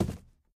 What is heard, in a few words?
phys cube, physics